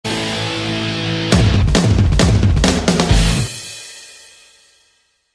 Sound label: rock sms